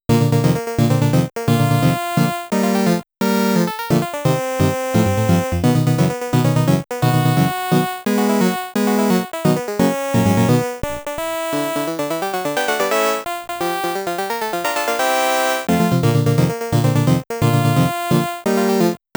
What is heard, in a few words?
In Game Music; the tower of druaga